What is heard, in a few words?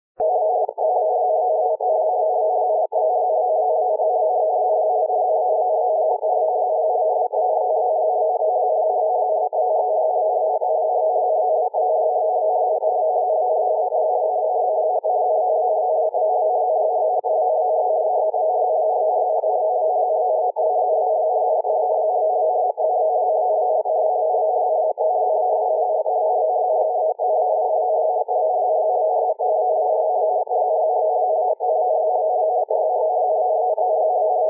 20500Hz